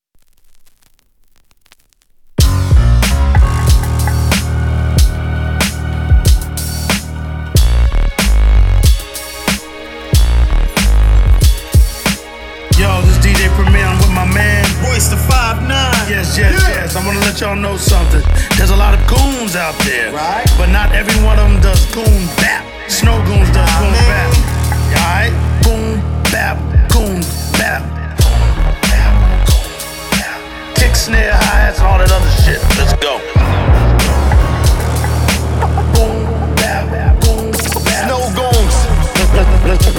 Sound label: kick snare and hi hats